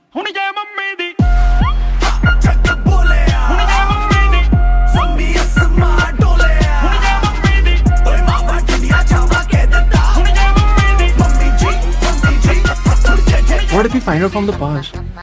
ringtone, bollywood ringtones

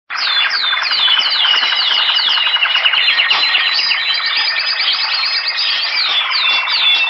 lovely forest birds musical tone ringtone

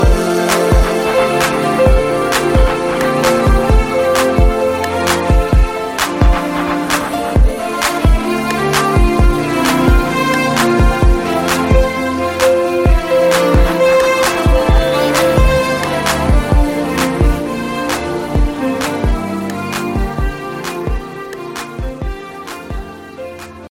instrumental ringtone, bollywood ringtones; highway

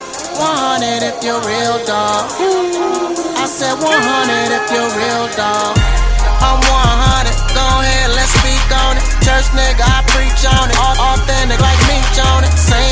hollywood ringtone

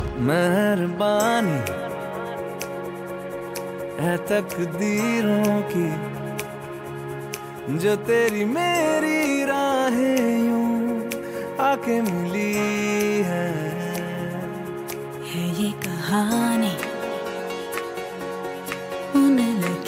bollywood ringtone

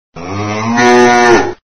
cow sms ringtone

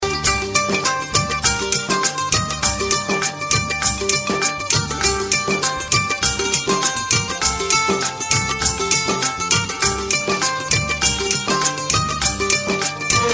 Punjabi ringtone; nokia

